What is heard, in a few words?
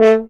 Horns